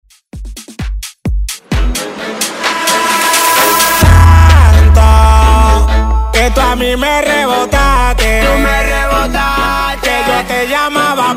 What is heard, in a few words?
Latin House; Redrum